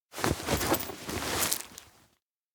bag takeout onigiri; anemoi

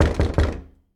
doorknock; anemoi

